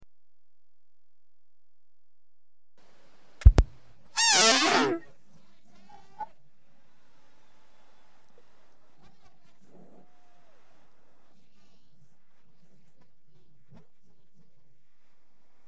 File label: no vocals; Sola Allyson leads worshippers in the rain at MASS 5.0 Concert Tour of Ibadan